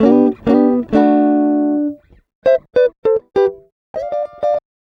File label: Acoustic Guitar 99 bpm G, African Guitars; Quazibeat; African Secret